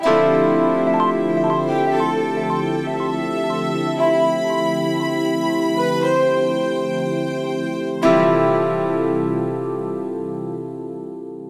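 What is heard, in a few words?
Music Intro Camera Forest; horse life 4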